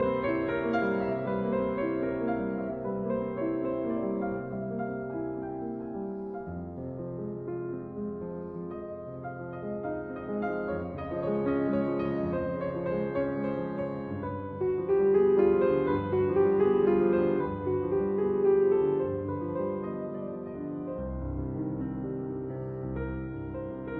Etude in F minor; 3 Nouvelles etudes Op posth; Etude No 1; Juana Zayas

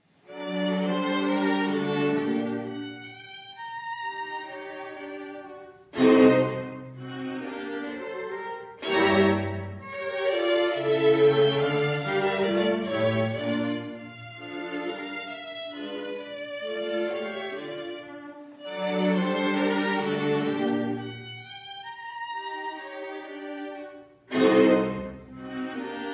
Viola Quintet in g, Menuetto Allegretto

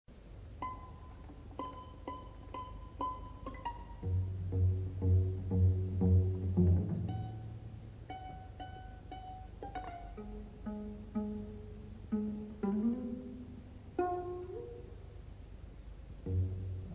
String Quartet Finale Andante

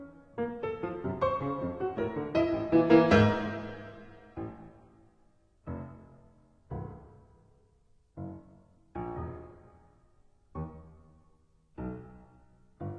Fugue in D major; 6 Preludes and Fugues

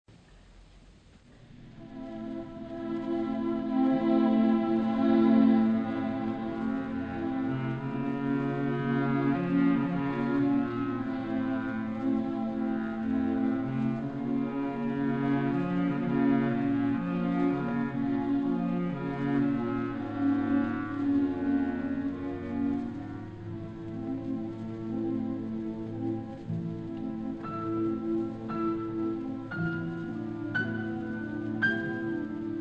Andante con anima; Khachaturian

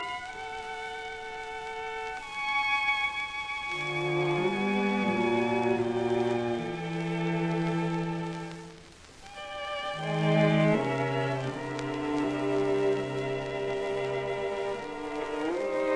String Quartet Adagio; Schoenberg

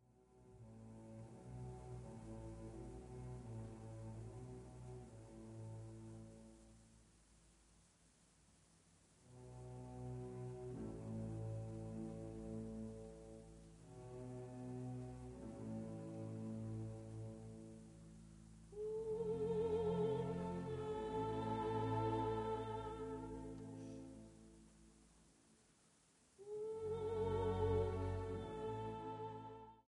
Symphony in D minor, Sehr langsam. Misterioso

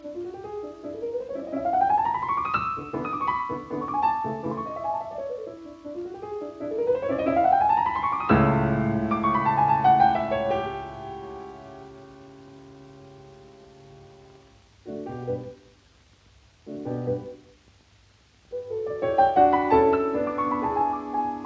Piano Sonata in D major Rondo Allegro; Op 10 No 3; Claudio Arrau

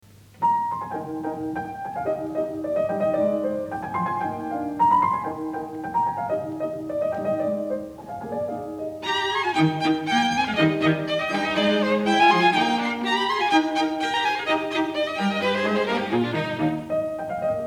Piano Trio in e-flat Allegretto; Mozart

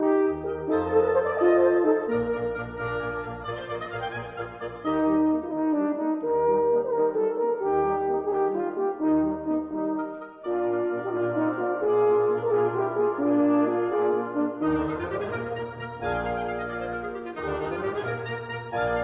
Concerto for 2 Horns Rondo Allegro; in E flat major

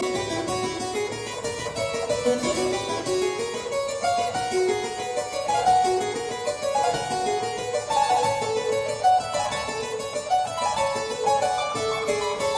Keyboard Sonata in D major; K 479

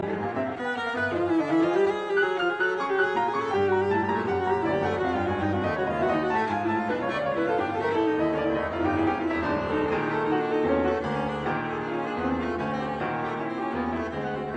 Cello Sonata, Massig schnelle Viertel Mit Kraft; Hindemith; Op 11 No 3

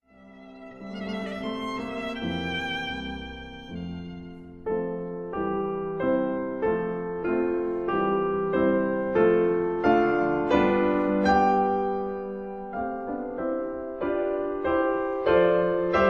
Sonata for violin & piano in A major, Andante; No. 9; Kreutzer; Op. 47

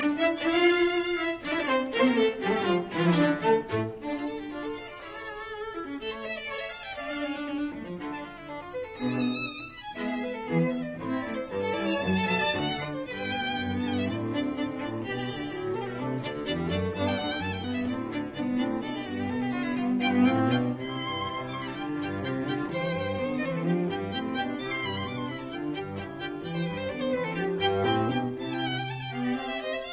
String Quartet No 1 in F major Allegro; Beethoven; Op 18 No 1

